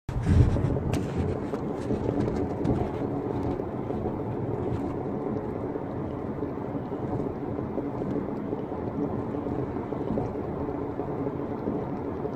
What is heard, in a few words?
Gurgling noise